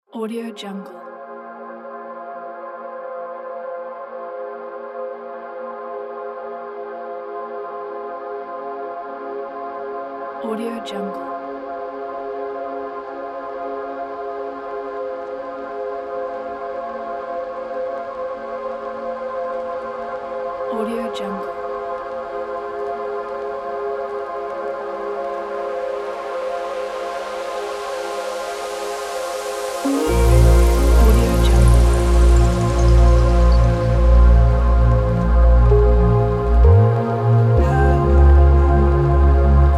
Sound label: future ambient soundscape; artsound